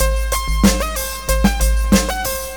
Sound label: drum loop